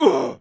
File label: damage, thief; thiefboss2